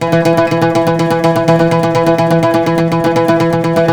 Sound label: PLK OUD, Roland LA Composer; Roland - LA Composer vol. 3